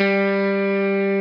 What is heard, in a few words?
Arp.